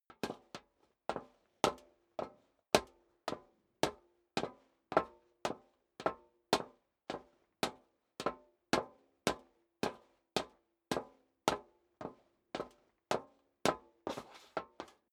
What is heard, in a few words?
step high heels metal dry upstairs sequence; schritte